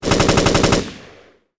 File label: colt m 16 ext shots